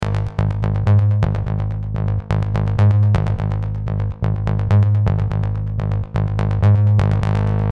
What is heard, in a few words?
125 G Bass; Wire